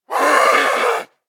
spotted, zombie; DayZ Epoch

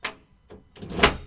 safe open; Add new sound files for opening and closing vaults.; DayZ Epoch; effects

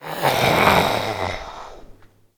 idle, zombie; DayZ Epoch

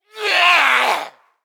chase, zombie; DayZ Epoch

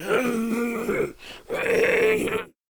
idle, zombie; DayZ Epoch

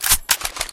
detach; DayZ Epoch; effects

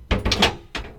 safe close; Add new sound files for opening and closing vaults.; DayZ Epoch; effects

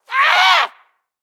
chase, zombie; DayZ Epoch

